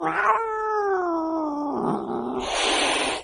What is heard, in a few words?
angry cat